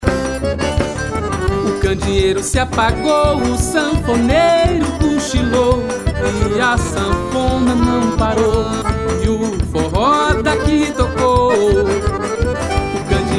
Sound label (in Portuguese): forró